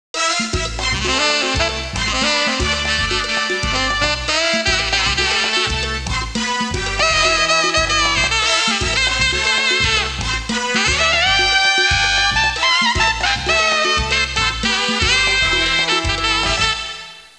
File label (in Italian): musica latina